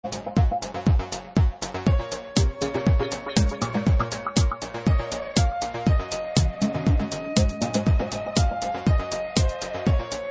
The Trap Door Synth Remix

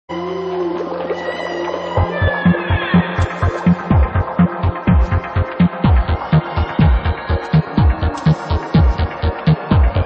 Liquid Underwater Dub Tune

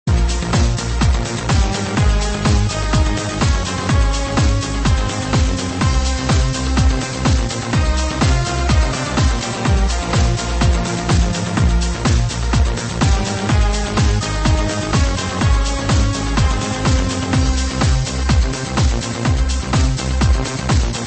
Renoise Remix; Ocean Loader 4

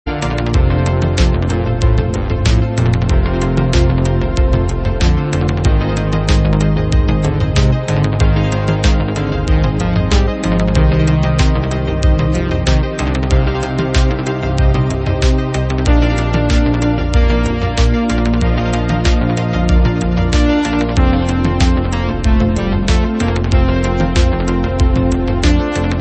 Analogue Horn; Ocean Loader 2

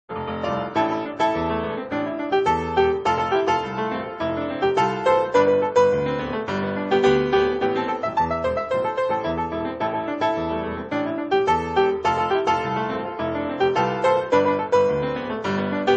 Space Harrier medley (grand piano)

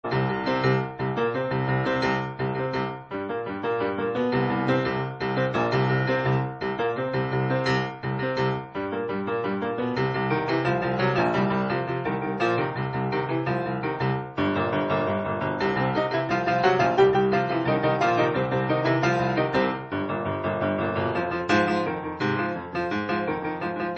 grand piano; Aliens

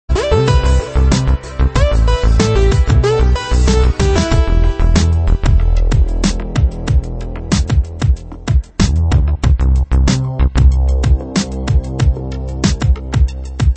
instrumental

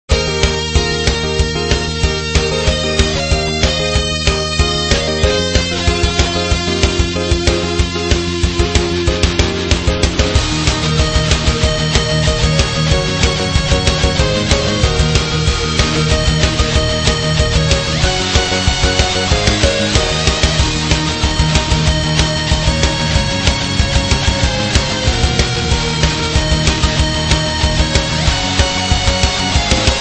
Guitar Remix; Turrican

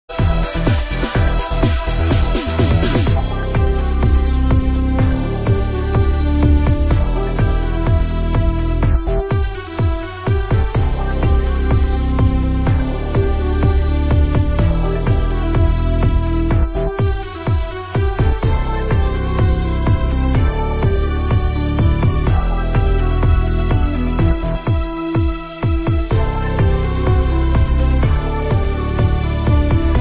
Rock Tune

